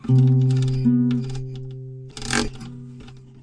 strange acoustic guitar noising

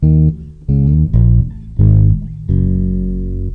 Fret bass lick; MELODIC SAMPLES

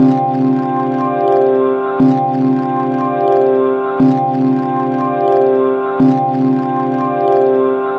120 crunchy ambient chords; SYNTH AND ELECTRONIC LOOPS

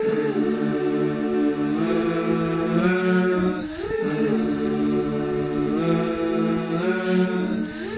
folksy harmony; REMIXABLE COLLECTIONS; Folksy Guitar and Voice Stems

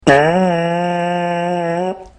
tongue trombone note; Horns and Brass

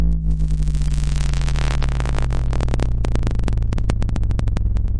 bass radioactivity; Basses

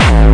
psycore distorted hit; drum machines

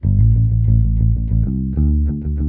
gentle punk bass, synth and electronic loops

